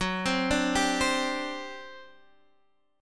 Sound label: G Major 7th Suspended 4th, F sharp